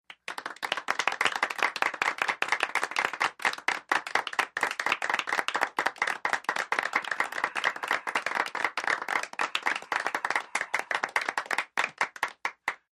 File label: 20 People Clap